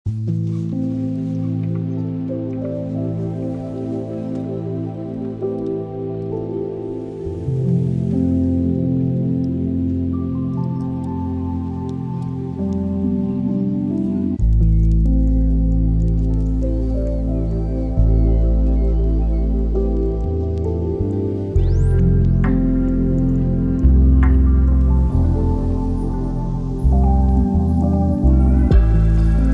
calm morning

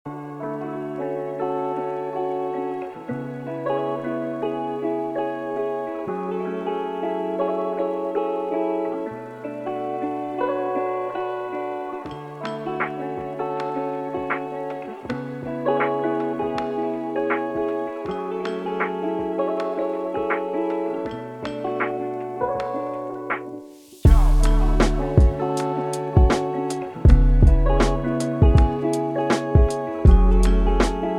lo fi hip hop chillhop music

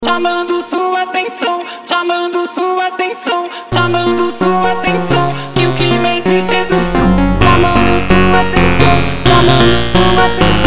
phonk montagem

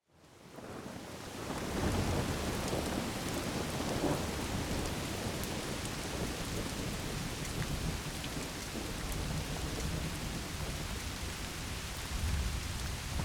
nature sound bath radiant thunderstorm

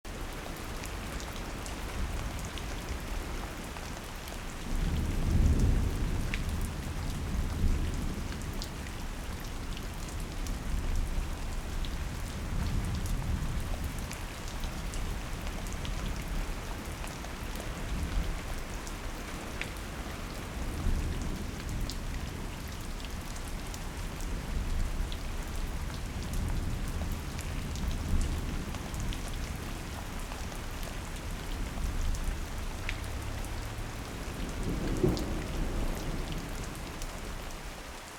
loopable thunderstorm

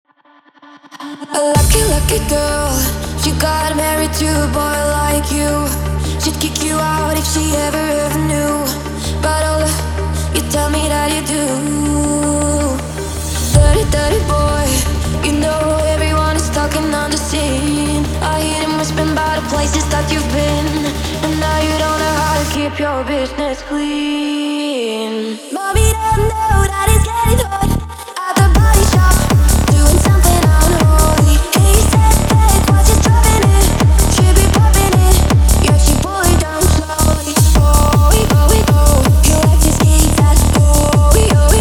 hypertechno

